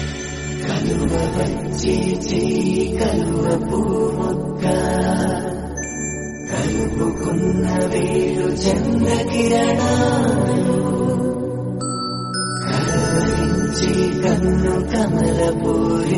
Telugu Ringtone

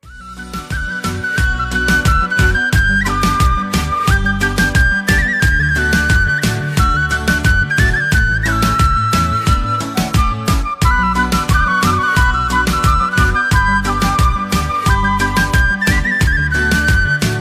Flute Ringtone

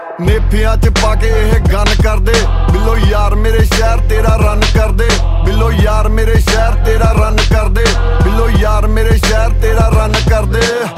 DJ Punjabi Ringtone

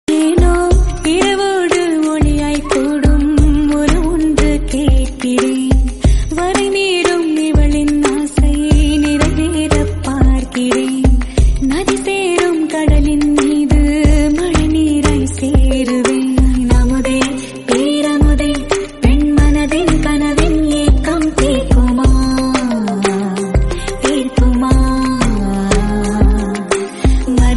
Female Version Ringtone

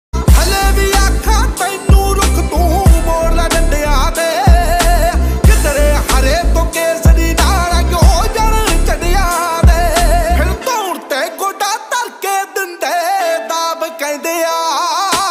DJ Punjabi Ringtone

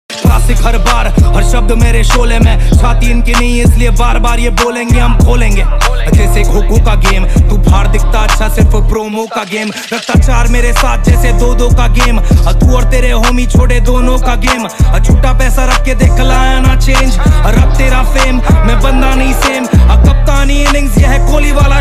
Rap Ringtone

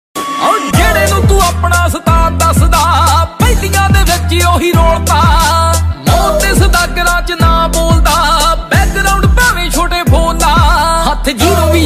Popular Punjabi Ringtone

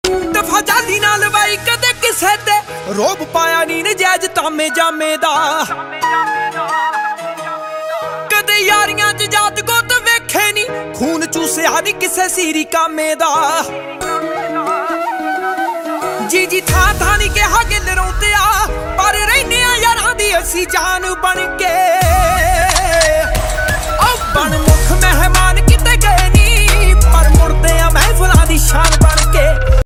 Dance Punjabi Ringtone

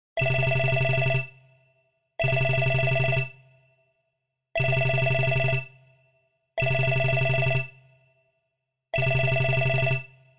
Iphone Phone Calling Ringtone

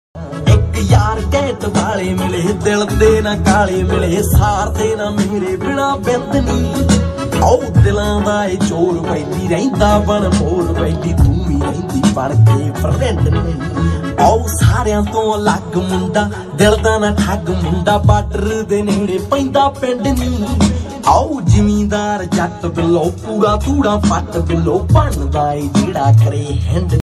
Punjabi Beats Ringtone

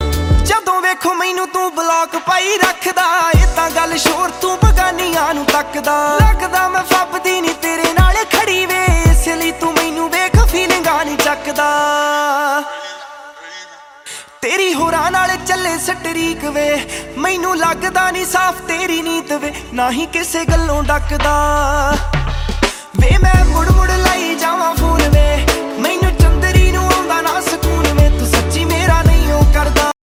Punjabi Ringtone

